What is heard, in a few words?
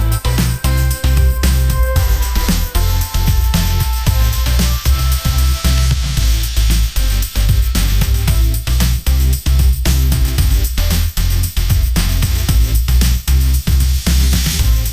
pop groove; ai